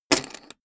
poker chip stack big fall down

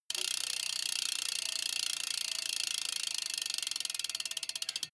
bmx bike freewheel push and slow down short

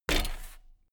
bmx bike land impact rattle

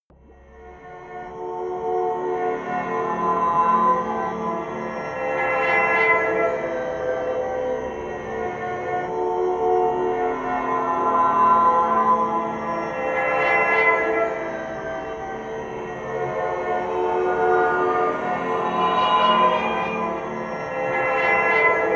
metallic sorrow

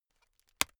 plastic slowly pressured click

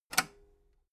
metal lever button radio disable fast

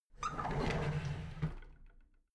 drawer big clatter close regular

